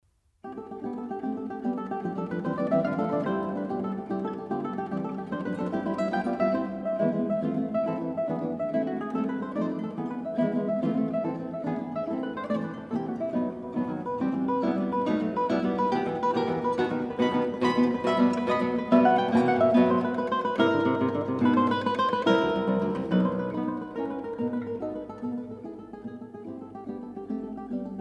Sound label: Song Without Words for piano, C major, Guitar; Classical Guitar; Mendelsohn-Bartholody; No. 45